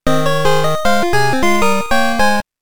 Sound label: Fanfare!; Pokemon OST Collection; Pokemon Gold Silver Crystal OST